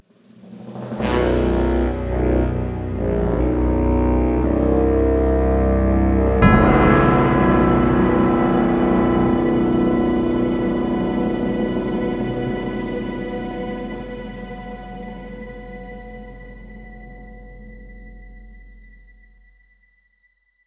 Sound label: Game Over